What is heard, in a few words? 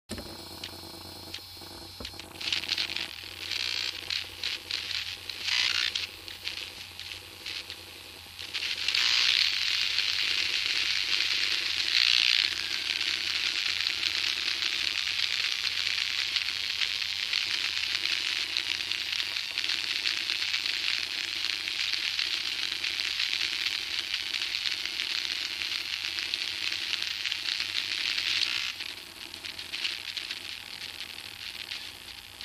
phone, Listen to Your Electronics